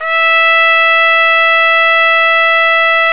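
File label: BRA TRUMPE, trumpet, brass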